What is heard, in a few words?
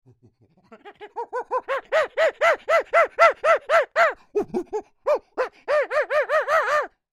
Creature Monkey Grunts Excited, Human Imitates Monkey; Monkeys; BigRoomSound